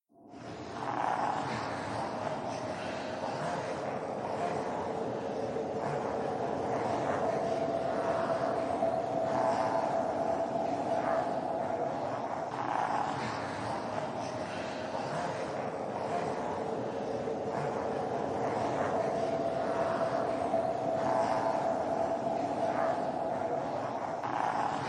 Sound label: Zombie Ambience